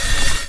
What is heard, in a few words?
cart rolling back grind; Team Fortress 2